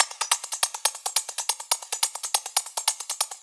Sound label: Addon Loop, Drum Loops 140bpm, Perc Loops